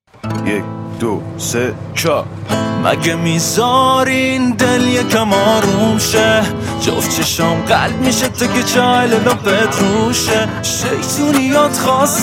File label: Guitar